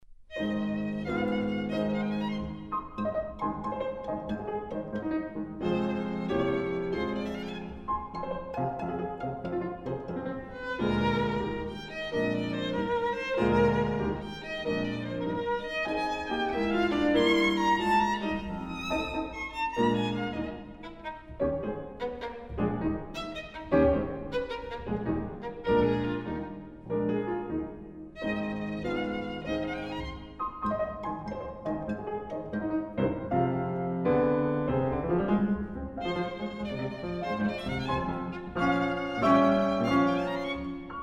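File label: violin sonata; glass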